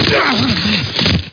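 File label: tackle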